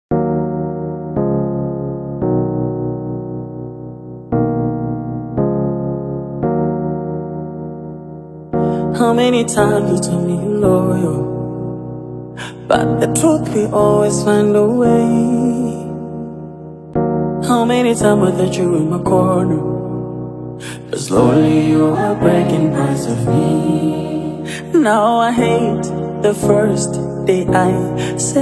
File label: Acoustic